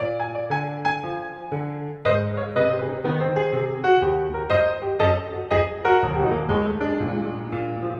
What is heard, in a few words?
piano wet; wizoo